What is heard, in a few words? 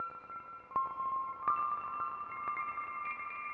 eurorack